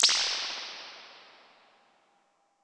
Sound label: Rack Spring Bright, Impulse Responses, Spring Reverbs; Medium Spaces; Apple